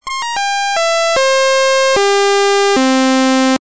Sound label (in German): Naturtoene C, Blech